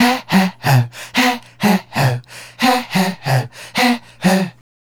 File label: Male Group, Hip-Hop and RnB Vocals